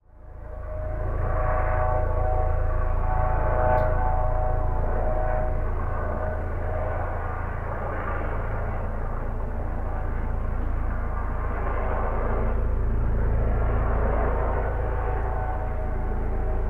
transport helicopter police fly overhead searching